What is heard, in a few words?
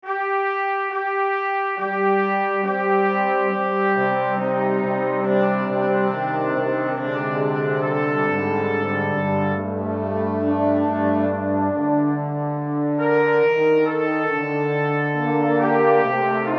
Coro Brass section